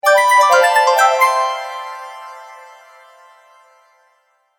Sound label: News Message, Cartoon; Cartoon Sound Design Sound Effects